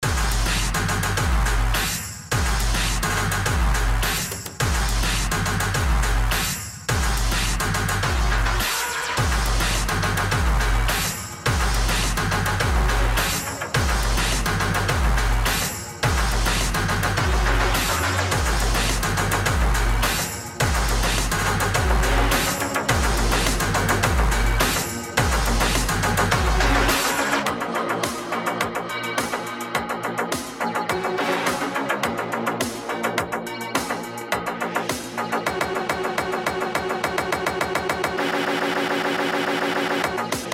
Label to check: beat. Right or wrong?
Right